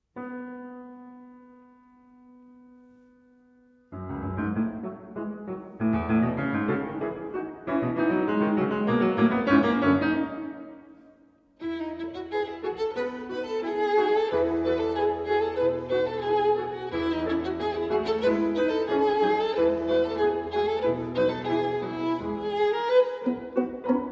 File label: Violin Rhapsody Friss Allegretto moderato